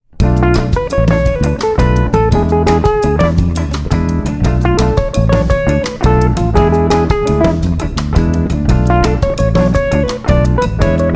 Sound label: dorian b5